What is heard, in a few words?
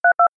telephone